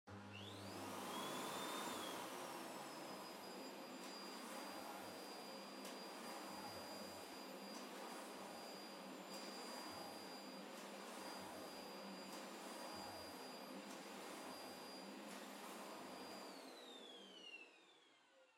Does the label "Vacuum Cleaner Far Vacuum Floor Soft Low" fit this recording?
Yes